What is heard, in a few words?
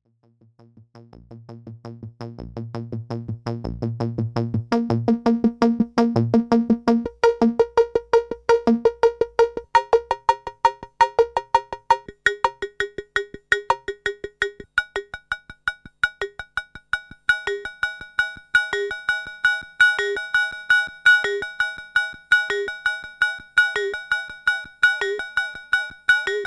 770 dry ringmod